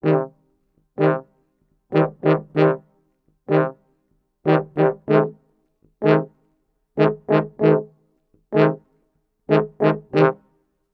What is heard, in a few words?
95 c min brasses, Orchestral arrangements